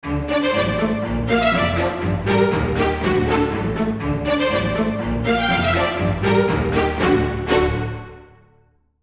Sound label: Bouncing, ringtones